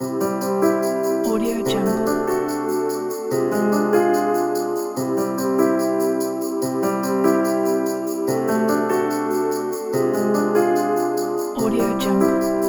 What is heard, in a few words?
jingle bells piano